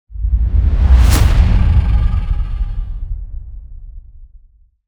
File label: wild reveal; netent